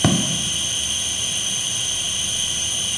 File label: IR MLS external recorder